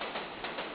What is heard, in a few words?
coaster